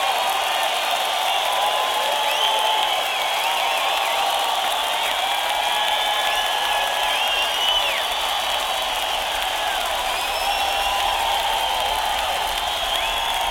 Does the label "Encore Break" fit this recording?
Yes